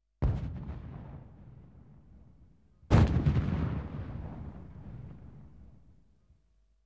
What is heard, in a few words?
Tank Fire Gun; KMP Film Video Sound Effects